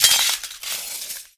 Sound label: broken glass land; Golden Crayon Sounds